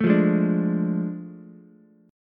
A Major 7th Suspended 4th Sharp 5th, Ukulele, chord; AM7sus4#5; strummed; JGuitar